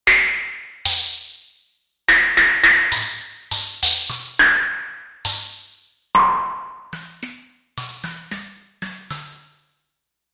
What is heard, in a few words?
Air imba, Yamaha DX11; Factory Preset Voices